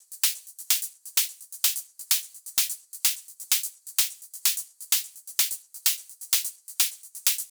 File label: Drum Loop Top 128, Melodic Techno; Glacier